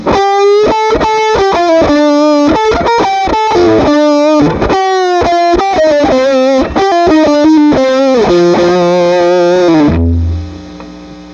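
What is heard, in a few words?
Pedal 10, guitar, Treble Booster; Guild 1984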